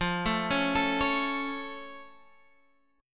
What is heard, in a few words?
F Augmented F Guitar Half Step Down